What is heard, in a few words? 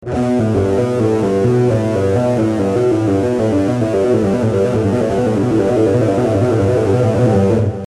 legato